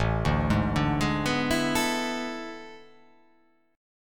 A Major 7th Suspended 2nd Suspended 4th

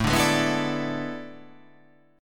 A Major 7th Suspended 2nd Suspended 4th

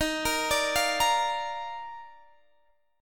D sharp Major 7th Suspended 2nd, Guitar, Standard; arpeggiated; x,18,20,19,18,18; JGuitar

